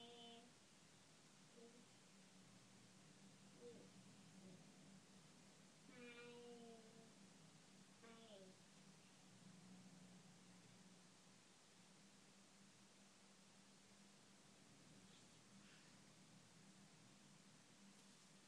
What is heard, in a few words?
early morning baby babble